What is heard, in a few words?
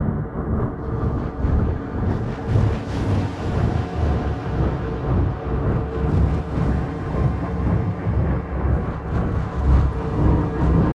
Loop Base; Test of Spirit; Illaoi